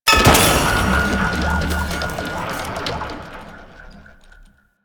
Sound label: Target Dummy Impact; Warframe; Duviri; Waiting Room